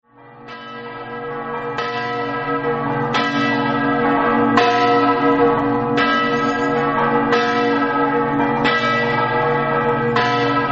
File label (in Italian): mortean, campane